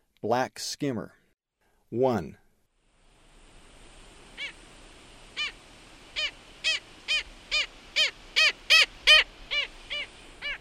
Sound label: Black Skimmer, Birds of Ecuador; S. America